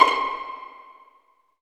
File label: Vlns Pizz; Roland L-CD702; Vls Pizz